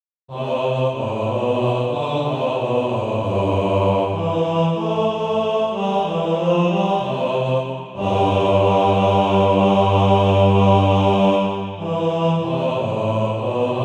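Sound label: bass, Practise Music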